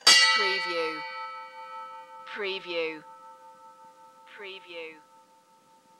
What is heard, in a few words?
Bell Ship Antique Single